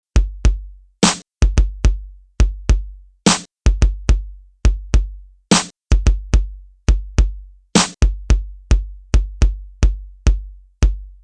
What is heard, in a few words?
japanese drums